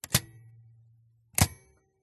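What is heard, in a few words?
typewriter shift press release; mkh8060; PMSFX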